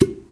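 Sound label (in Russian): попкорн одиночный короткий мягкий близкий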